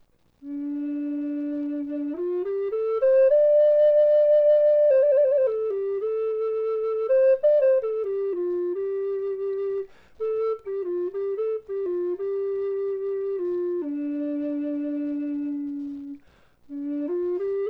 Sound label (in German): Hoerprobe D Floete Zeder Nuss